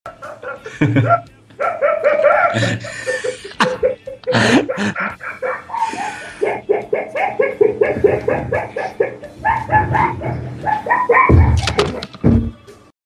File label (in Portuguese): risada infinita